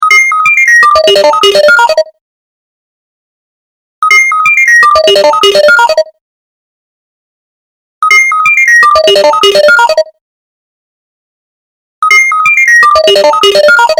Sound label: Alien Alert, ringtones